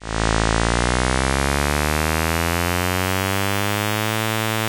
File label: sweep